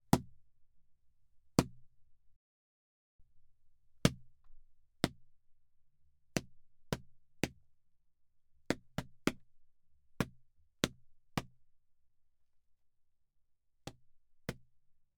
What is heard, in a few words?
Punchy Hitting Padded Surface With Bare Hands; Artlist Original